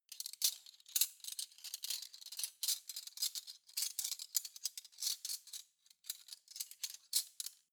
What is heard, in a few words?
cutlery spoons handle long